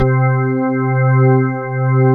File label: ALL STOP, CHURCHY